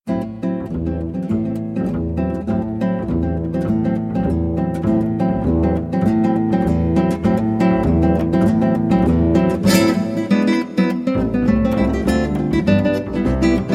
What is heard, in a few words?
Danza Brasilera, trio